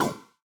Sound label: ball bounce cold; CrossCode; battle